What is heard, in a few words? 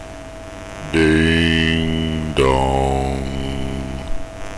bell